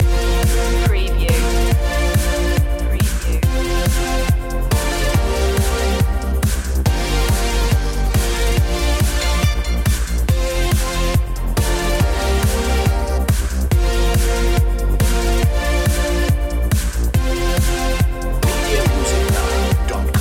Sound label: oriental loop